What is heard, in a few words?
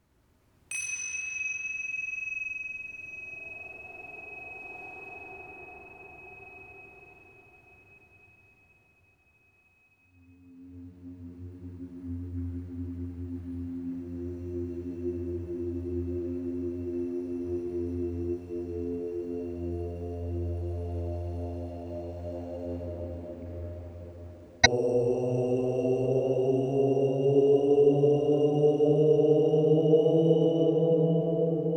Harmonic Choir